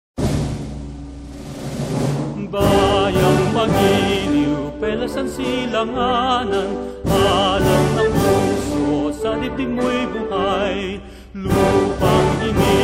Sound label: Philippine National Anthem, the Lupang Hinirang Tenor Solo